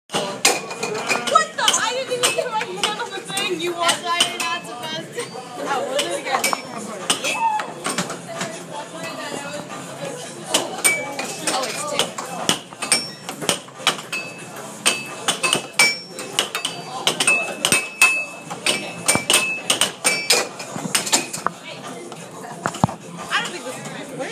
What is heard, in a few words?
Field Recording Game Room; voices